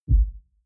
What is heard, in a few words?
sub bass